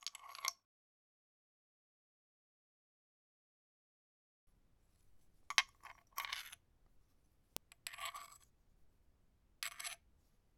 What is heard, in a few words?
stone, Foley; Varazuvi; California Foley